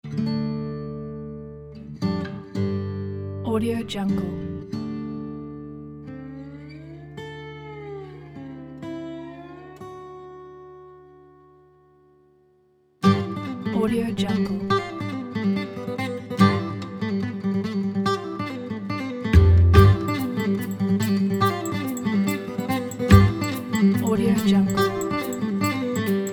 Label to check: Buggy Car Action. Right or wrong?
Wrong